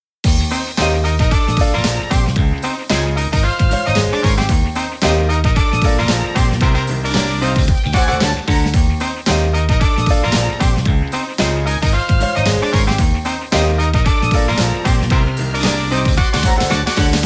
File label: Aggressive; Nanairo Reincarnation